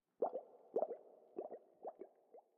水下气泡声; ambient

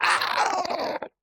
死亡声; 炽足兽

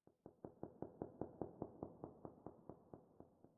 水下噼啪声; ambient

